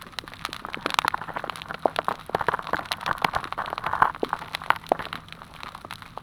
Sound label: dragging, soniferous; Gilligans Island